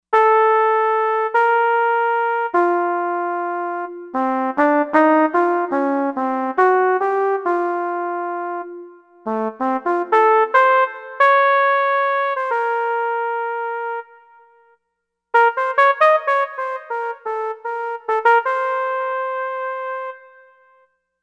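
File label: Brass Soft Flugelhorn Lead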